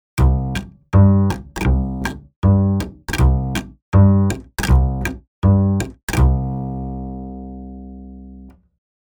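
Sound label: roots urban note palm flick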